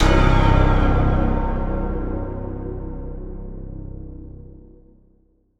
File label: Horror Sting; Apollyon